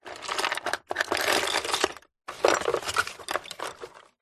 drawer wooden full of loose bits and pieces rummage